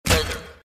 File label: ehhh bleh